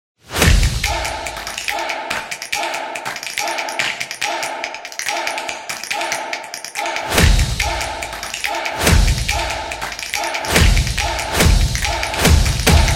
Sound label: This Is Percussion Beat